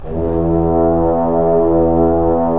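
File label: brass trombones; Roland LA Composer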